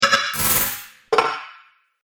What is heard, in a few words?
Robot Factory Noises; Robot Sound Effects